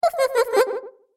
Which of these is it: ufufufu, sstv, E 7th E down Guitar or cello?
ufufufu